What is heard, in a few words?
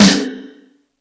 Present Snare Single Hit G Key, steel snare drum tuned to the G note